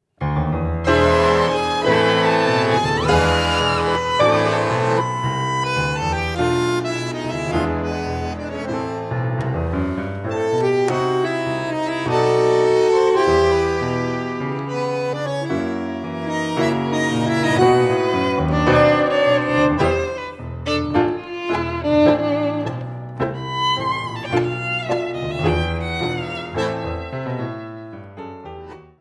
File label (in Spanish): Tango progresivo, Tango